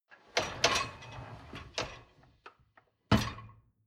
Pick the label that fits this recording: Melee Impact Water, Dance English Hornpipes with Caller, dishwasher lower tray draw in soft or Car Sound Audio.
dishwasher lower tray draw in soft